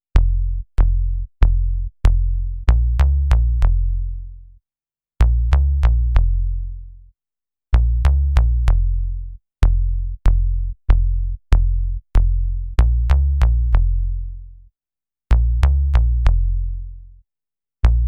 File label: Funky footsteps no drums; Advertising music; CHILLED AMBIENCE; Advertising music volume 1 CHILLED AMBIENCE